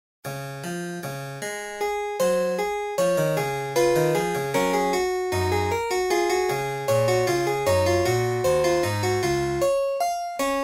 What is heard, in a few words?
harpsichord; Csharp Fugue; F Fugue bk2